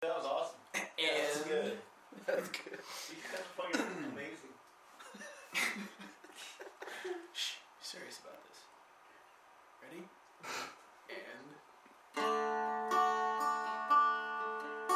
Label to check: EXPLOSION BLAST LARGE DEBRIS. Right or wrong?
Wrong